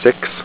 time clock

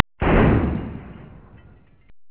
explode

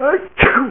Ah Choo

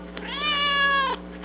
cat shorty

